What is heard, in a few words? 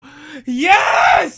Yeeeeeessssss